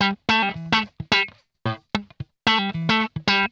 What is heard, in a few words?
PLUK, Funk Guitar; 90 s Sample CDs; Zero G